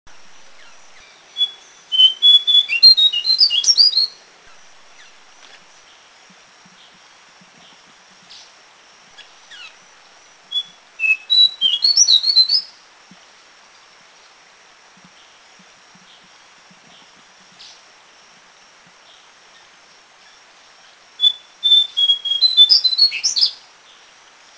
Lesser Shortwing; Thailand

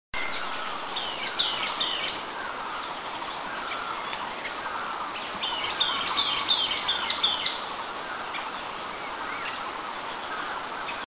Sultan Tit; Eaglenest; India

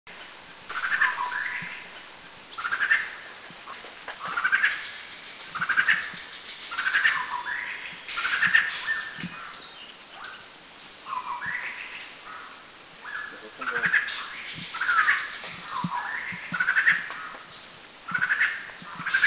Collared Babbler, Thailand; Kaeng Krachan

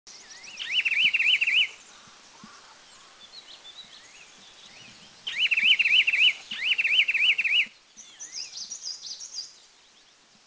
Bar-winged Wren Babbler; Dirang; India

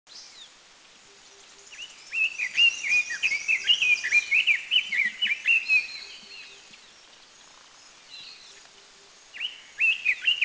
Red-billed Leiothrix; Dirang; India

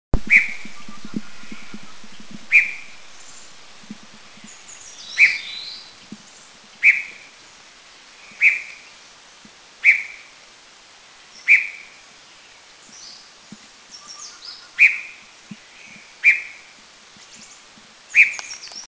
Black-headed Shrike Babbler; Eaglenest; India

